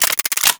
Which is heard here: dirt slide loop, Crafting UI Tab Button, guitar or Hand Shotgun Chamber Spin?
Hand Shotgun Chamber Spin